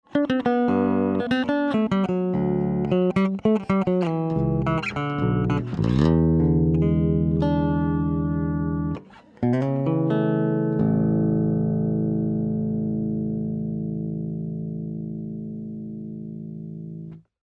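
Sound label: bridge pickup, bass; peavey cirrus6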